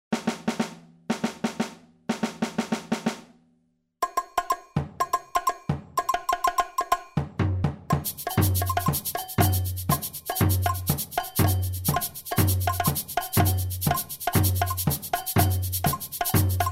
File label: batucada